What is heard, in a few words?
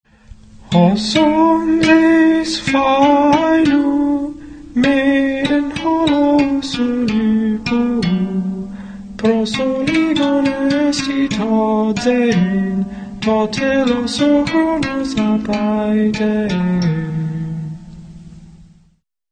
sings Seikilos